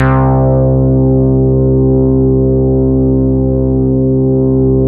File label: Mini bass C2, Mini Bassics Vintage Keyboards; Big Fish Audio - First Call Vintage Keyboards